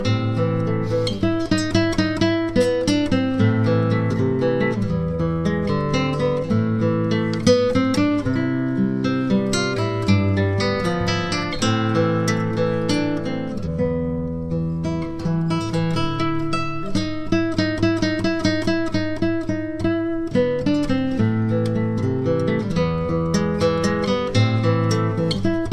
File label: Fur elise